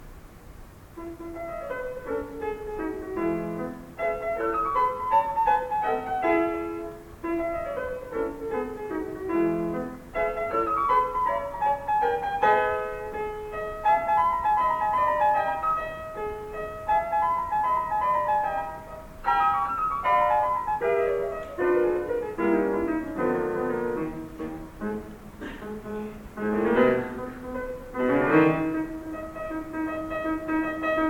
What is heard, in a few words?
Recital